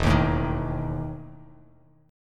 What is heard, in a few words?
E Major 7th Suspended 4th E down Bass Standard; EM7sus4 strummed